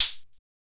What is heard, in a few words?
Percs